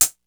MPC 60

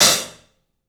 HAT, CLUBHAT, 3D Drums; 3D Sound

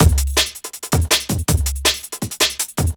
breaks 162

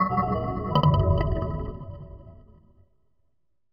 Blub; Sonic Foundry (Sony Creative Software) - Future Retro Dance Excursions

